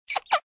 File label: mouse short